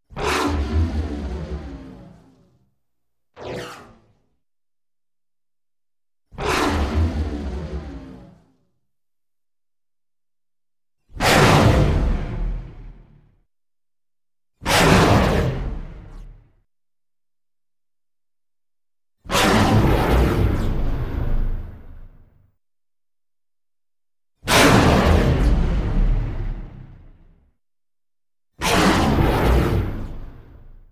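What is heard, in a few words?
Flaming Debris Flyby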